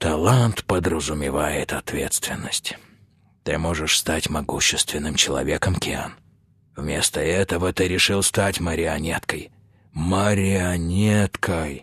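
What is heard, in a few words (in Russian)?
garmon, accordion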